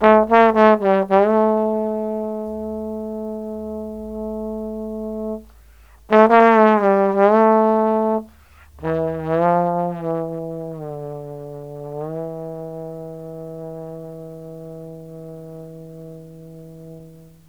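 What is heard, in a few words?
TROMBONE SOLO, BRASS; BRASS KIT; Ragga & Dub Reggae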